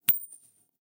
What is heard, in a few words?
Coin Flip Shimmer